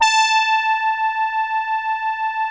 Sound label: BIT BRASS, Vintage Keyboards; Big Fish Audio - First Call Vintage Keyboards